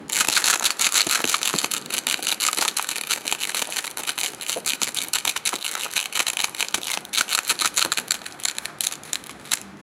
robotics

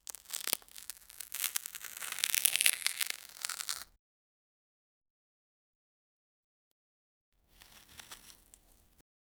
orange peel off from orange, fruit

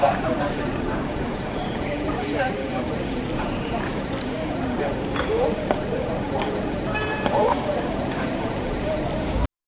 busy city background in, 18th century

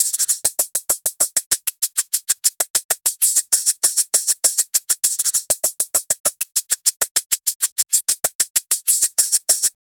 beatbox hi hat; incredibox

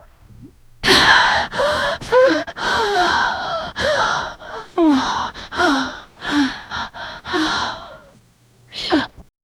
aggressive demonic female

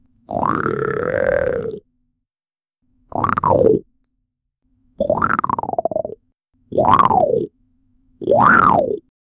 cartoon crunch a loud cri; transitions

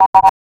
trademark sound effects, advertisement

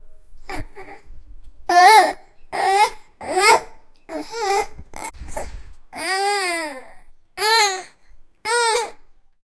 year old girl, effort

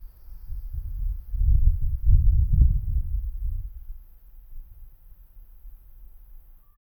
slow wind though the fiel; afternoon

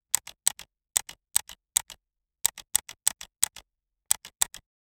button typewriter, button press

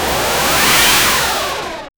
battle attack sharp and

